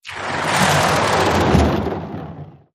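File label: Whoosh Power Off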